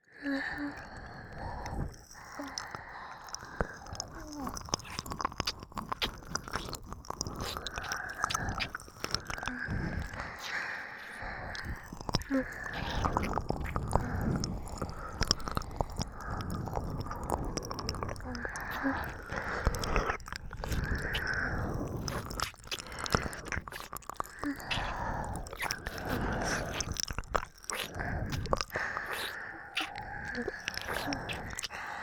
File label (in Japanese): 両側耳舐め＋ピストンフェラ